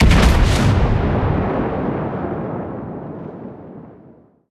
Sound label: ambient port; World Of Warships; port3d